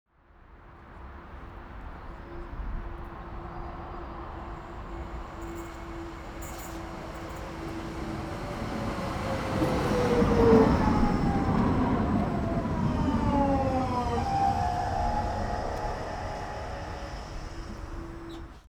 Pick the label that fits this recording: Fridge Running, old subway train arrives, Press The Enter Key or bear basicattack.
old subway train arrives